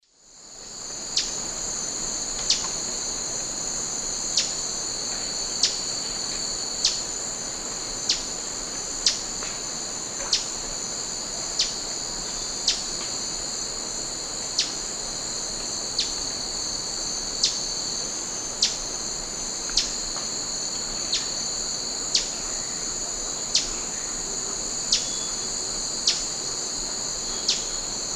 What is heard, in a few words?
Campylopterus largipennis, song; S. America; Eastern Lowlands